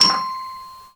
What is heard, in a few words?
Bally 1000 points bell